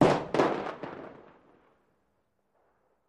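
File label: 2 Oz Black Powder Bomb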